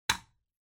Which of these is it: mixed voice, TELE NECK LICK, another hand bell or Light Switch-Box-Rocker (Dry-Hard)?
Light Switch-Box-Rocker (Dry-Hard)